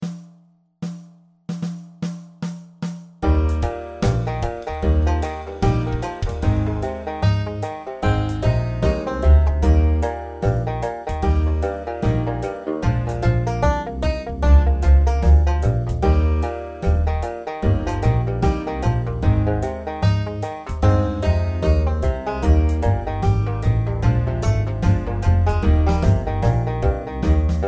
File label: Tuba